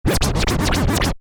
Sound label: Scratch, Record, DJ; Musical; Stuart Duffield